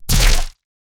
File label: slime enemy hit